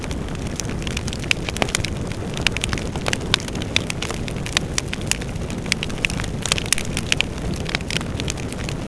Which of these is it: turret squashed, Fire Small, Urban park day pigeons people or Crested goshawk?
Fire Small